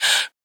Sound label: Breath In; FREE SFX PACK VOL 2